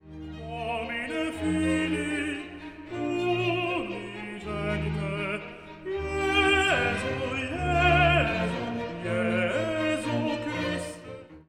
Gloria Domine Filii

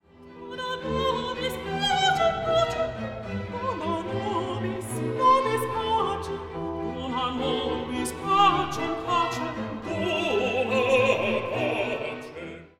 Soloists

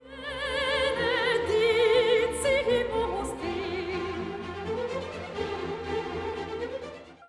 Laudamus; K427

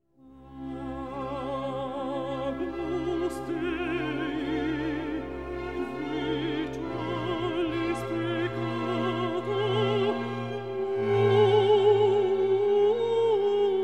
Agnus Dei Alto

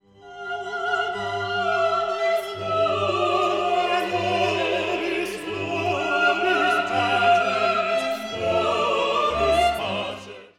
Dona solo; K337 Agnus Dei

